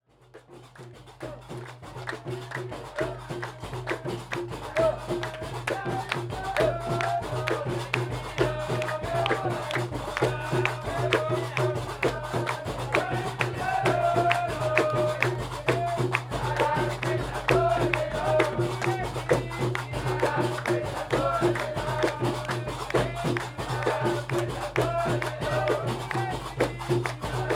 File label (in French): Concert Gnawa Timimoun Algérie